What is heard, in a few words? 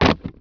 book drop; vampire demo